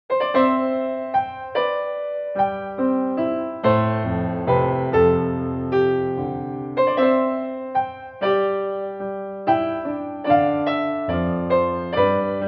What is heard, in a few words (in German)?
Einzug Instrumental